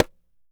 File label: CLAY FRAM, CAMEROON; AKAI S6000 CD-ROM - WorldSounds; CAMEROON PRC